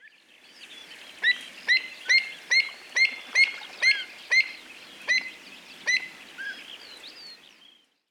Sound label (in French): avocette elelgante